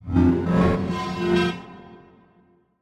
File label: Transition Metallic Warble; Production Element; Title Transition; AdobeCC